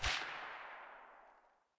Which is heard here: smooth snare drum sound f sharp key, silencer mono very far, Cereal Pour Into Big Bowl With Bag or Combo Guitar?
silencer mono very far